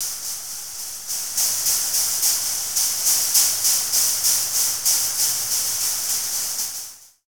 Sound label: THRASHY ME, Thrash Metal; SFX